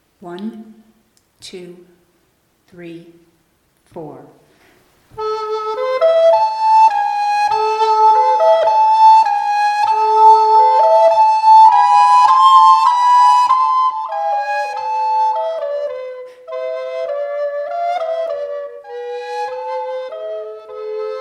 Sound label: Adagio both altos, recorder; Loeillet